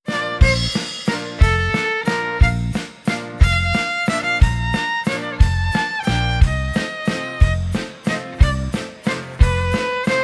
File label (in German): Violine Wiener Walzer